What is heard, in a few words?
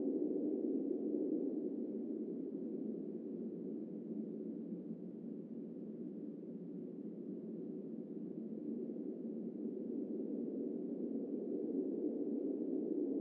atmos inside vent